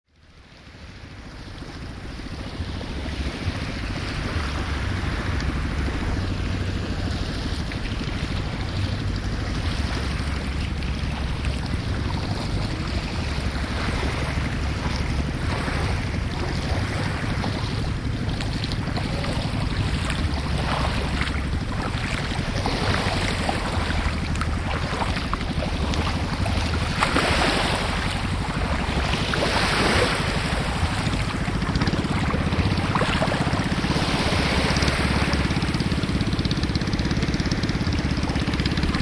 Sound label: kophangan beach, thailand